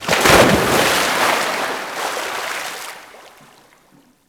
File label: Pod Water Splash; Ambience; Props; Warframe; Orokin Derelict